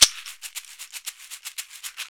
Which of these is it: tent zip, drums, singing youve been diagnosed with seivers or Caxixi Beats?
Caxixi Beats